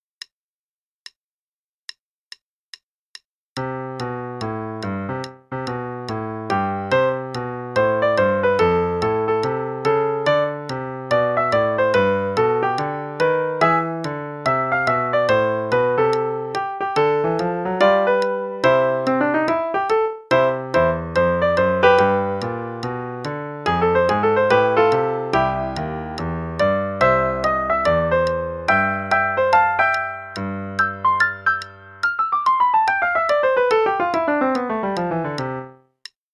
We Wish You A Merry Christmas (Jazzy, qn=144), Piano; Play-along; Faber 4